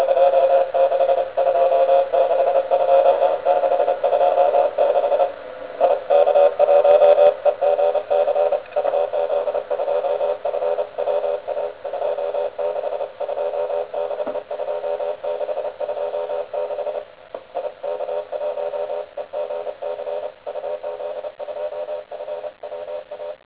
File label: cw; MS; UZ2FWA High speed CW; reducing speed